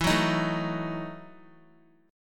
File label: E Major 7th Suspended 2nd Suspended 4th, Guitar; strummed